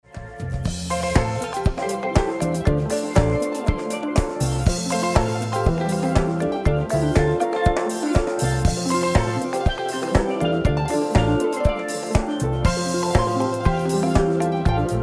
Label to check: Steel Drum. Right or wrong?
Right